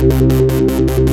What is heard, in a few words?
Korg, FX